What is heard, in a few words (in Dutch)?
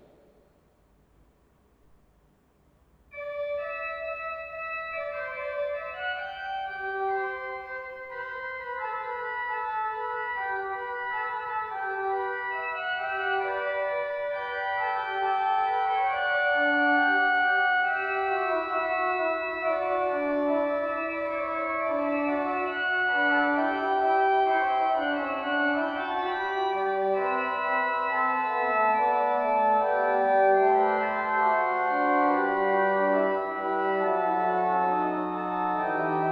fuga manuaal 2 elektronisch, orgel; Alteveer; Drenthe; PKN